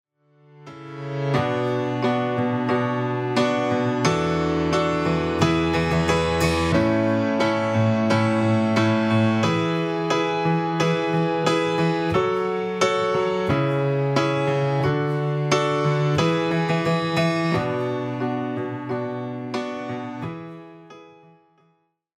piano squash

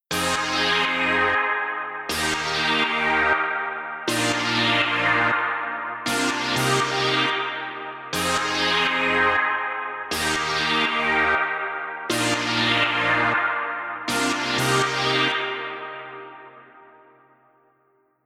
chords racqetball verb; fabfilter

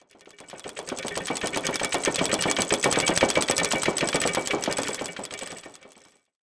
Move Gears; Tsugi

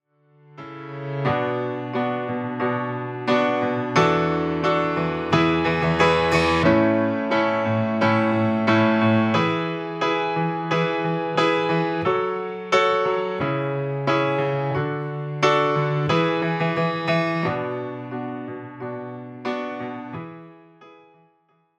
piano no effect

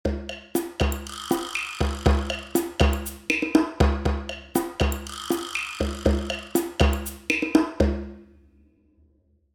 drumloop stairwell

